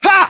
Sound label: "ha", Voice